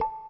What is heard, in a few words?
raindrop; Samsung; GT-B7320 Omnia PRO ATT